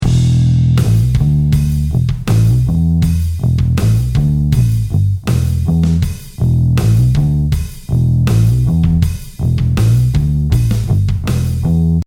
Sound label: Bass